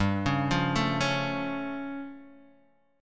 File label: A Augmented 7th G